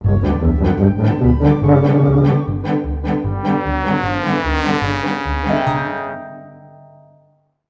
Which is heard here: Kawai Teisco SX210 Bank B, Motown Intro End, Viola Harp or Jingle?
Motown Intro End